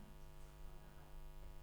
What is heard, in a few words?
Room, Choir